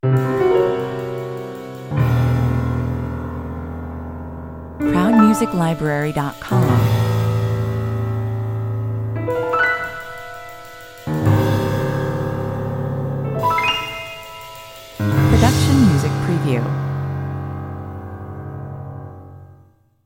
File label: piano atmos; Abstract and mysterious. A solo piano exchanges free form improvised chords and whilst the notes get higher, the bass answers deeper every time as light cym